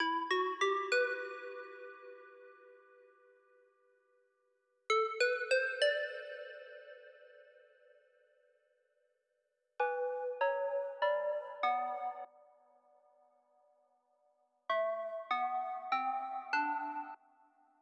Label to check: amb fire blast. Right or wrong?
Wrong